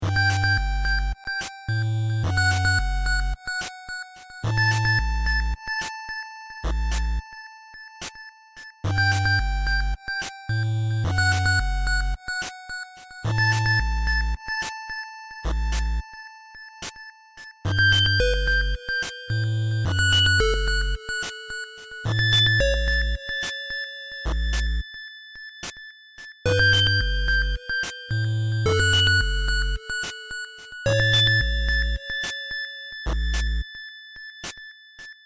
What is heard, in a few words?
Mountain Tunnel; dragon fantasy book i the volumes of westeria